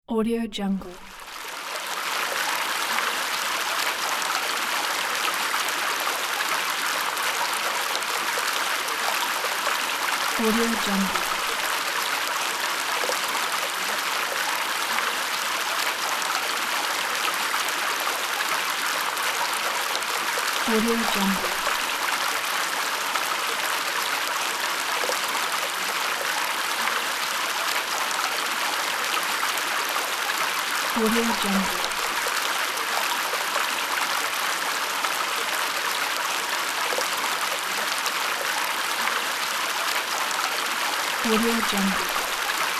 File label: water flowing